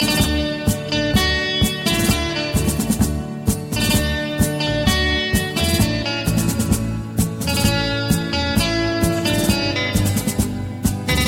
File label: tango guitar, ringtone